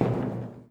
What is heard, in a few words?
step metal